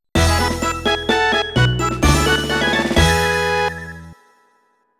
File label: Mini-Game Victory